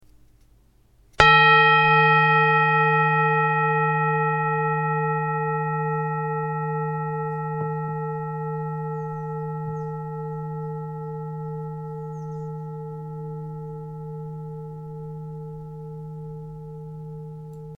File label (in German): Klangschale